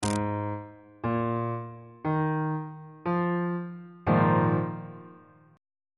a sharp dominant 7th suspended 4th 3rd inversion on bass clef